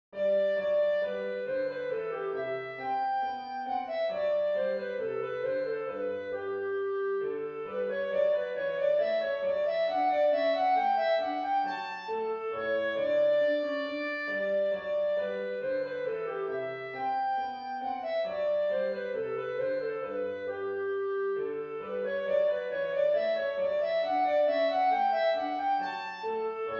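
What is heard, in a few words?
bourrée, G major; sol majeur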